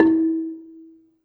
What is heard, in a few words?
Gambang Soft Beater, Gamelan